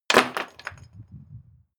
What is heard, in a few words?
rubble sparse fall indoors